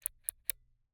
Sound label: Camera, Kinusa K77, 50mm lens, plastic, serrated, lens adjust, slow; Eiravaein Works; Vaeyan IV